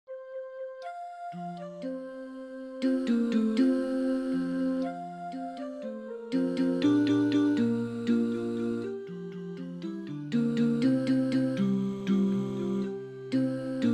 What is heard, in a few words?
Bawo Thixo somandla tenor